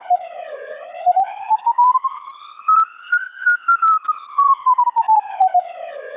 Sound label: siren dmr